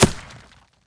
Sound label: ground hit, damage; DCS